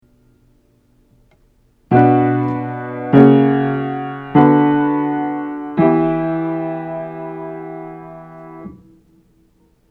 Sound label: Second Inversion Triads; Part Writing; Aural Training exercise